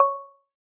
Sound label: countdown tick increment; revanced youtube